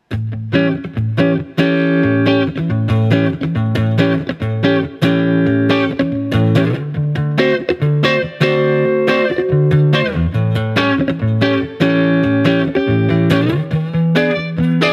Guild X500T 7th Chords, guitar

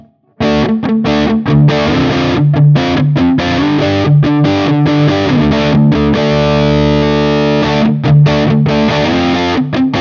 Guild Nightbird I D

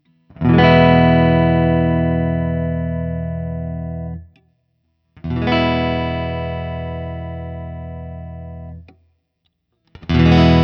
Guild CR01 ODS100 E

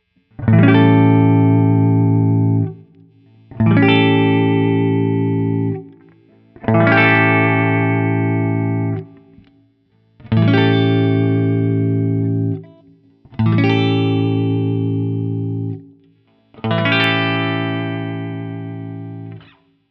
Guild X170T, A7 Barre Chord; Guild X170T Mars from 1999; ODS-100

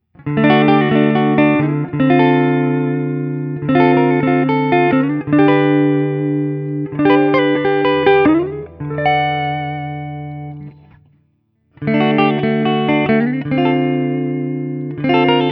Guild Starfire III, ODS-100, D-Shape; Westerly-made; Guild Starfire III from 1997

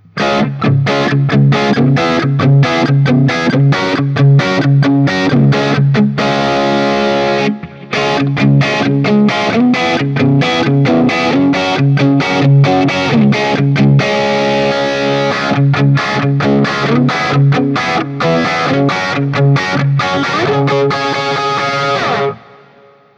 Crossroads Doubleneck 6 JCM800 D, D Shape; Guild Slash Crossroads Doubleneck

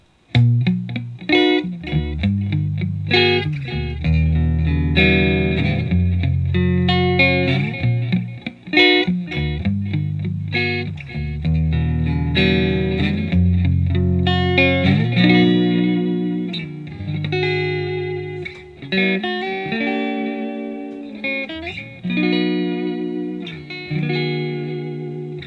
Guild NS X175B, Guitar; guild 2015 newark street x-175b guitar

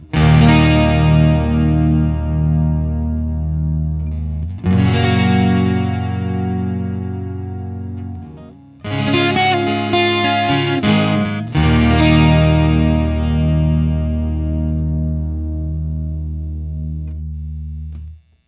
Crossroads Doubleneck 12 ODS100 Open, Open Chords; Guild Slash Crossroads Doubleneck

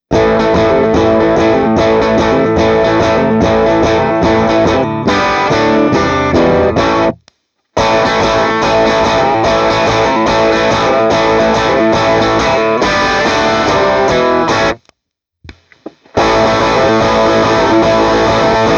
Les Paul Stacked Off 80s 90s Both, Treble Booster; Guild 1984

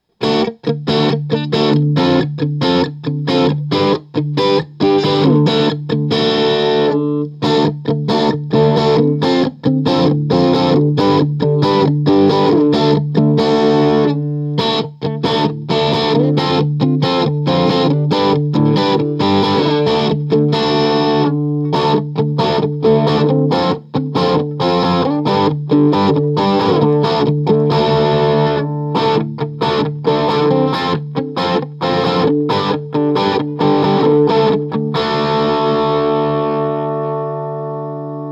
Guild S284 EMGs D; Guild S284 Aviator with EMG pickups

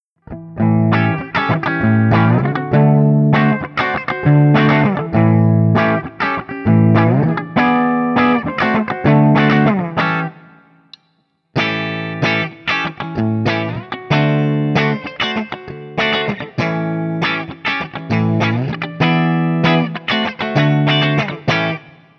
Guild S65D ODS100 7th

